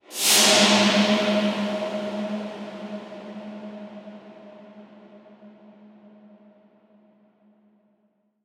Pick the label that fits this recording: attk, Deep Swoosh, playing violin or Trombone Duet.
Deep Swoosh